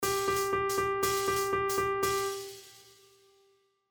Quartolet ternaire

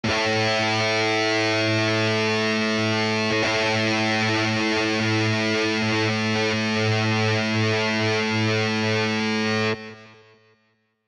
BATTEMENT

